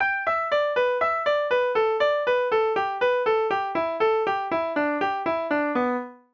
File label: Pentatonic; First Steps